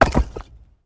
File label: building fall small; tanks; War Thunder